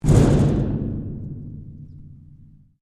Strike Metal Hollow Tank Rattle; Materials

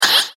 Cartoon Swat Hit; Miscellaneous Cartoon Sound Effects; impact sound effect

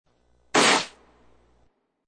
A loud crunching sound effect.; Crunches

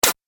Droid Click, Robot Sound Effects

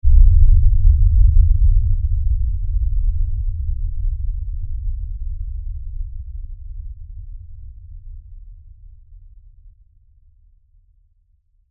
LFE Tremor Deep; Rumbles (Sound Effects:Sound Design)

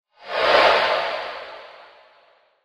Fast Flyby Transition Breathy; Scary Soundscapes; Horror; Crafted Signals

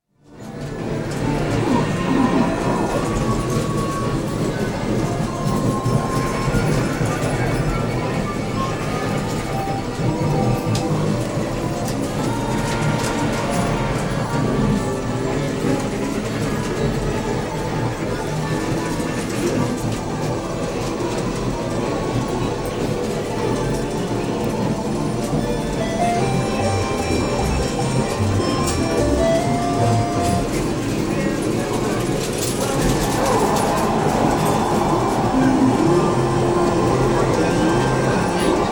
Electronic Games; BBC

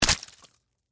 Splat Guts Fall, Wet, Juicy; Horror; Blood and Guts; BlastWave

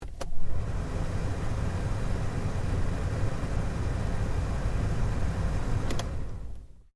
Hyundai Accent 2008 fan operating; Hyundai hire car; Vehicles; Cars

